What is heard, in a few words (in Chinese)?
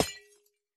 铜灯破坏声